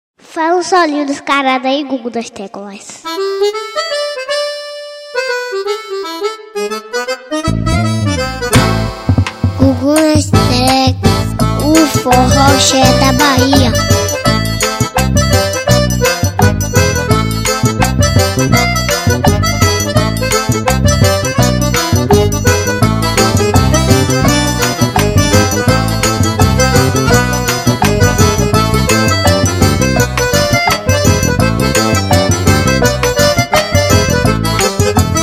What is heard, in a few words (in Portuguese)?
instrumental xote